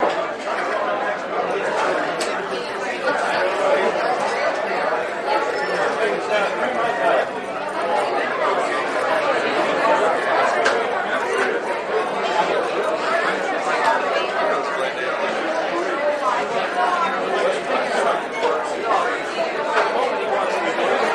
Bar Atmos Loop Med Cro; bars & discos

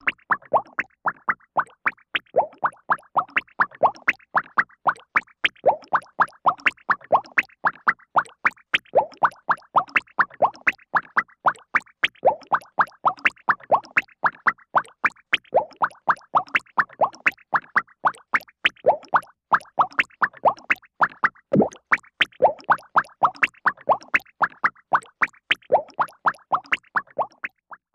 Funny Bubble Quick; bubbles

